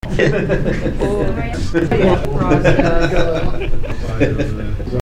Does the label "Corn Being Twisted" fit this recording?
No